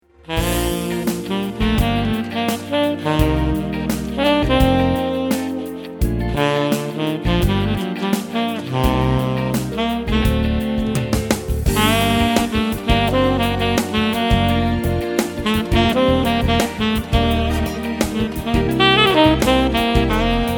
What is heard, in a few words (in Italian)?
sax